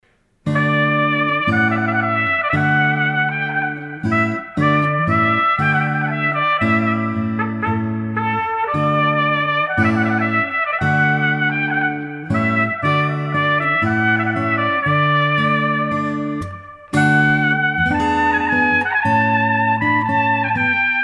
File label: Trumpet Voluntary Trumpet and Guitar